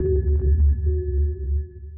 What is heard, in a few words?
sonar Tail Suit Far Shuttle Directional; Barotrauma; Real Sonar